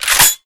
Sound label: pump, weapons; m37